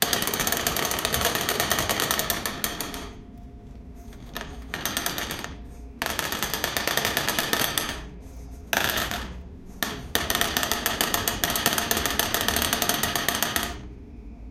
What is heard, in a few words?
ball rolling to slot, board games; sound effect